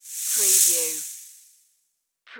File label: Snake Hiss; sound effects bible